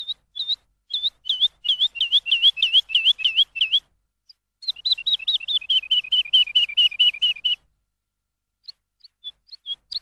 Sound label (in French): Bruits et chants oiseaux